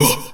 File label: oufh, Voice; ooh ahh